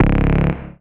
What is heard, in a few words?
Bass C#, techno synth shot; Ghosthack; Techno Synth Shots 2025